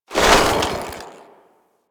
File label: Worker Ice Lever Push; Warframe; Venus Open World; Venus Solaris United